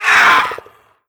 pain, headcrab reviver

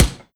rubber, bullet impacts; physics; Half Life Model Pack

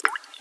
rain drip; ambient

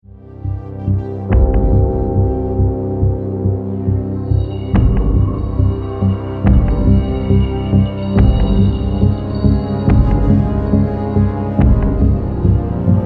round ten sec count, music; halo

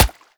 water, bullet impacts; physics; Half Life Model Pack

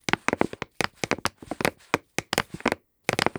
soap bottle crush loop; crushables; Half Life Alyx

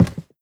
step light, footsteps cardboard

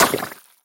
spew, infestation, bulb

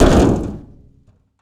footsteps land metal vent; player

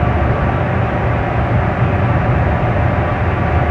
amb baggage hangar, ambient atmosphere

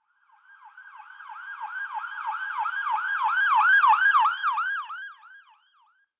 ambulance, ambient

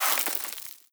debris sand, bullet impact debris

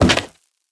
debris cardboard, bullet impact debris

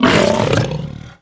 pull grunt, barnacle creatures; Half Life Alyx

